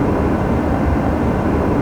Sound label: 777 wind